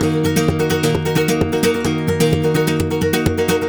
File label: 130 D# Rumba; Spanish And Gypsy Traditions; Soundscan vol.59; AKAI